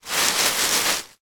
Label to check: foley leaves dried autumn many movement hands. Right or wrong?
Right